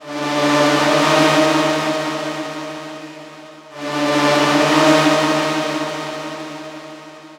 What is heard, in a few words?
Pad Loop 130 D#; Techno; Loops; Pads; Ghosthack; Free Techno Sounds 2024